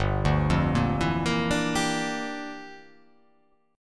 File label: G Minor 13th G down; Gm13 arpeggiated; JGuitar